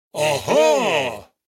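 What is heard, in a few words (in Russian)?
ахххаа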